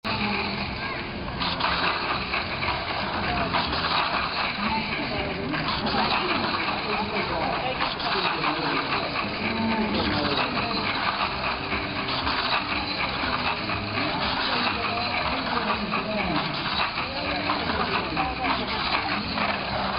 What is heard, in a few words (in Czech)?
autodraha